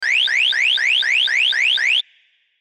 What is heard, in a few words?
energy ray